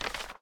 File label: Footstep Paper-Sheets